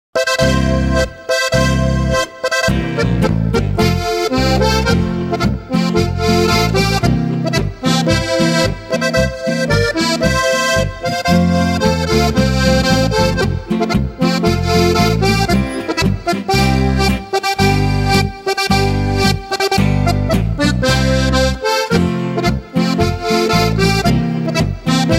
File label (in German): Marsch